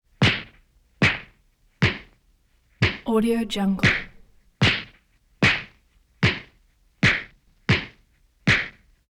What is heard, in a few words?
chin socks classic face hits punches; prosoundfx